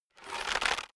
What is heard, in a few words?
bow load